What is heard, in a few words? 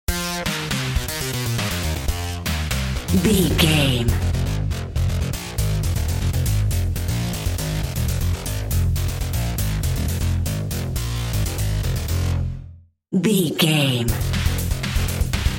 intense challenge video game narrative